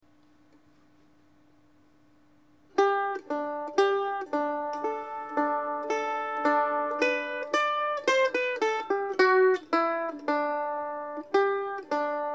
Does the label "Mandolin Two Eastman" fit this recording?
Yes